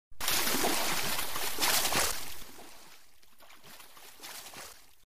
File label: croc, animals; Duplo Zoo